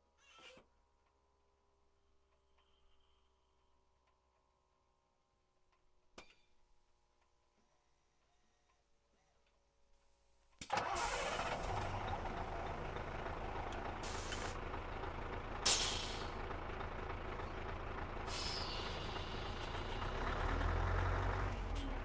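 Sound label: Scania 143 onboard slow start drive stop reverse drive stop off engine gearbox; dpa4061; Sonniss GDC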